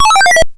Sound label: Coin; SolarFox